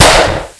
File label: revolver; weapons; svencoop